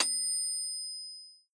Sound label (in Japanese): メトロノームのチーン